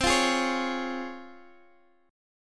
Db Major C; Db/C; strummed

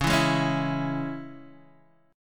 C sharp Minor down Guitar Open D

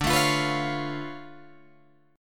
D Minor Major 13th, down, Guitar, DADGAD

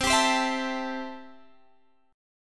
C 6th, C, down, Guitar, Standard; strummed; capo=2

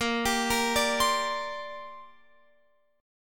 Bb 6th Add 9th Bb Guitar Open F; Bb6add9 arpeggiated; x,17,19,17,17,19

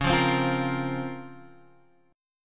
Db Major 13th, Guitar Half Step Down

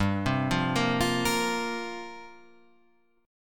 C 7th Suspended 4th G

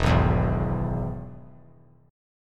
E-Major E; strummed; JGuitar

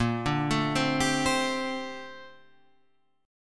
Bb Major 13th Bb; arpeggiated; JGuitar

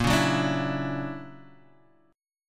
D Augmented Major 9th A sharp, guitar

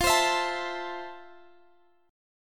F Major 7th Guitar Open F; FM7; x,x,17,19,19,16; strummed

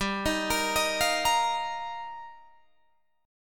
G Minor 7th Sharp 5th, Guitar, DADGAD; Gm7#5; arpeggiated

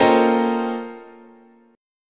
Bb Minor Major 13th, guitar chord

